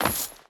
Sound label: Stone Chain Land, Footsteps; Free Fantasy SFX Pack By TomMusic